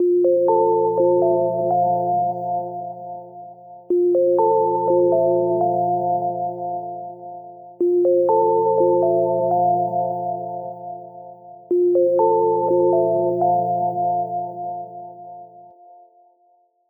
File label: Gentle Bells; HMD; Pulse